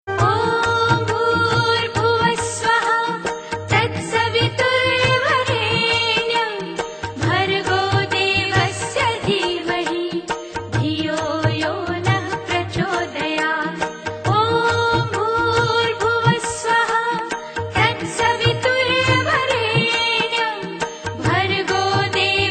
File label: gayatri